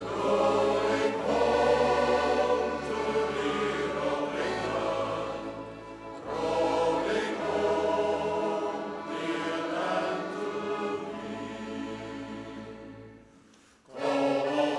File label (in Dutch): Koor (mannen); Scheldeloodsen